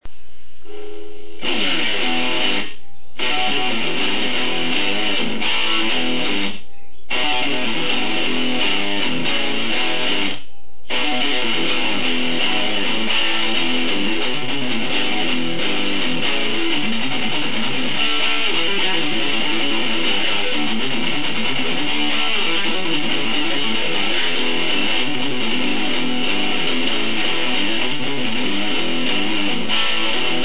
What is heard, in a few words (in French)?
4 accords